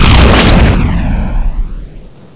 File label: SPELL; Hexen II; eidolon